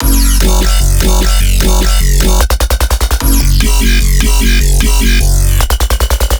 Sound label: Bass Loop F# Destroyer, Dubstep, 150bpm; Ghosthack; Dubstep Sound Arsenal